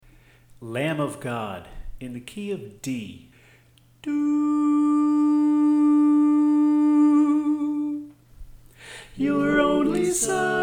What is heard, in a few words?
Alto